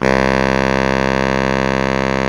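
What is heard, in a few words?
SAX Baritone Sax C 2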